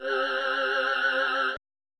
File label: Pad